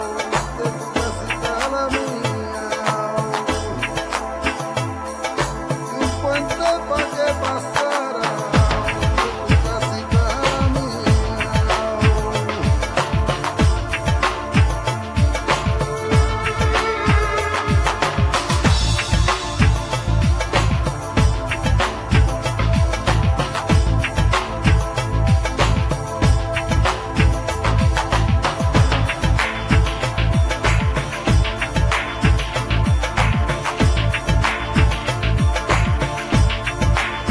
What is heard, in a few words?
Flamenco Chill